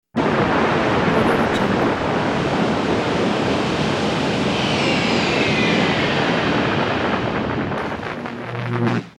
vortex suction wailing

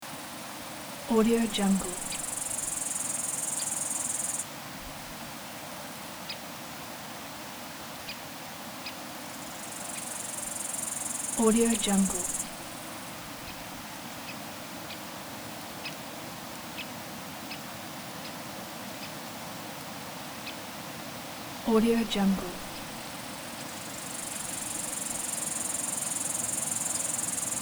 grasshoppers

